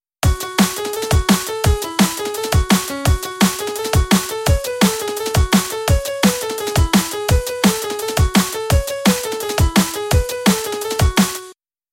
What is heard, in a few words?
polka